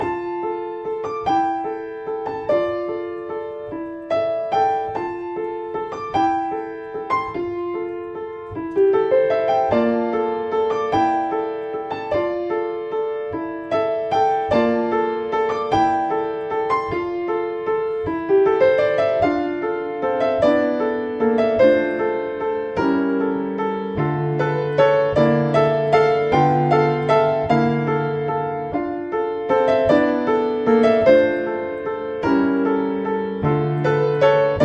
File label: Petite piano